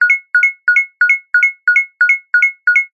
hospital monitor beeps dull 2 tone high pitched

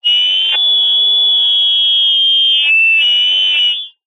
technology cb radio two way feedback

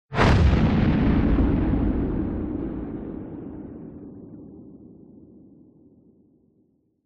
bomb large distant explosion

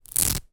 foley baseball cap velcro adjuster strap rip; zapsplat

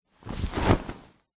foley fabric nylon thin single flap

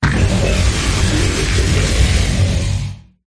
Sci-Fi Explosion; Ryan Van Eerde

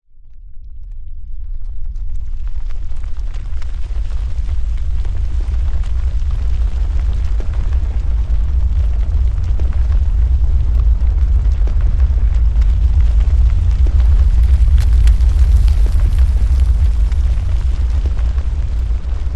nature avalanche rocks debris deep rumble

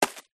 footstep single ground farm hay dirt; foley; zapsplat

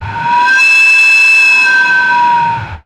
sound design effect air pipe pressure; sound effects one

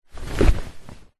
household mattress sit down on; zapsplat

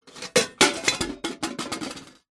impacts metal cooking pots hit drop crash

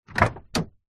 truck small door open handle plastic, vehicles

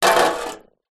nature water small short trickle hit thin metal

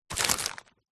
magazine cheap pick up; household; zapsplat

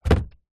industrial engine oil large tub set down; zapsplat

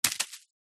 food passion fruit fall from tree onto ground; zapsplat

